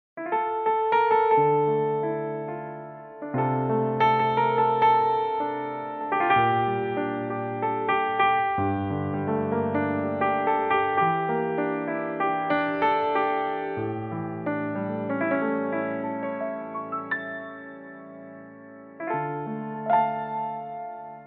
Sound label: piano